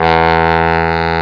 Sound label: Bari Low; Instrmnt; Sound Library 2000; Wayzata Technology